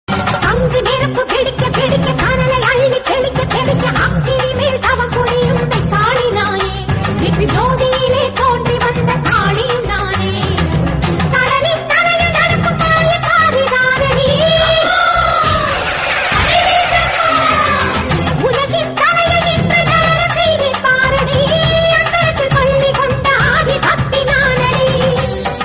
bgm background music; padai veetu amman

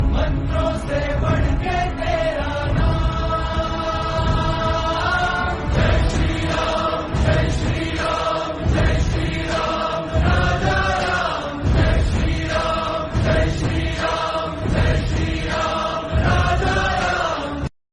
devotional bhajan Hindi

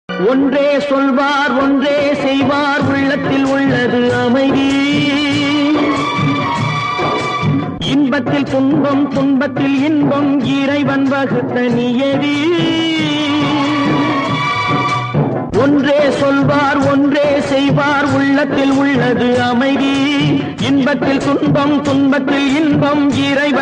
old songs remix

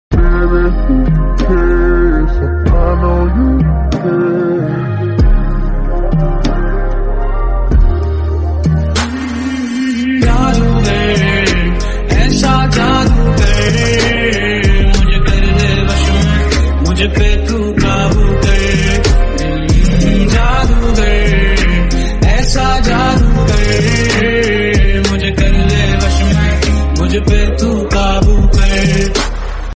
slowed

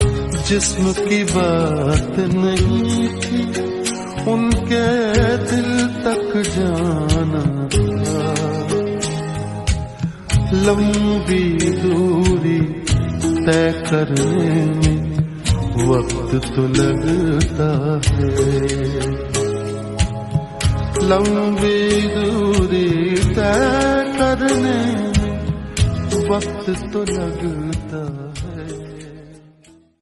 hindi old

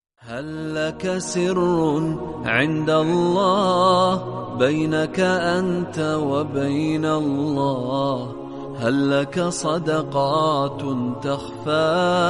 islamic

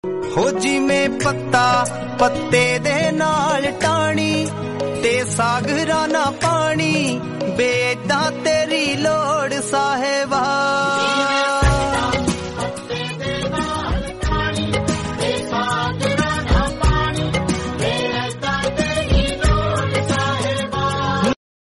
dharmik shabad punjabi

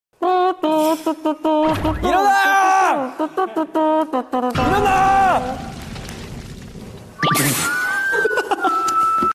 alarm

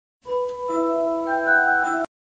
bgm notification

